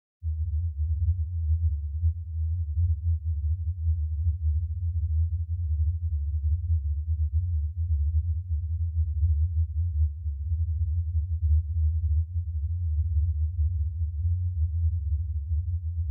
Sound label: Subwoofer phase 80Hz; Subwoofer Evaluation and Setup; Surround Spectacular